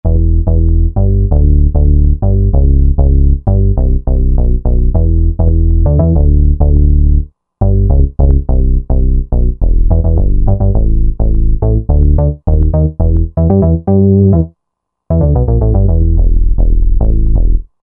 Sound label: Deep Bass, Roland JV-2080